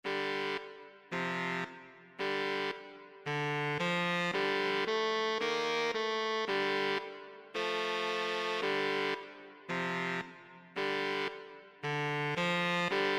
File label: SATB SAX, saxophone quartet